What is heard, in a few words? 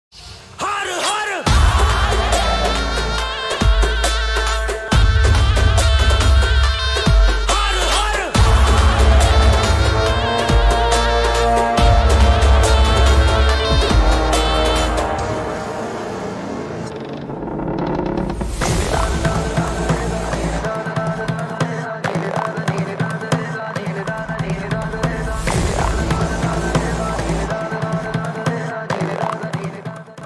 instrument ringtone; mahadev; bholenath